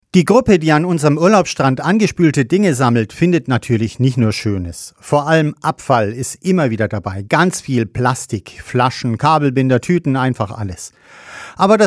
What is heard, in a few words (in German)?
gong; Heiko Kuschel